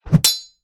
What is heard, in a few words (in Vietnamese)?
Tieng thanh kiem chem vao kim loai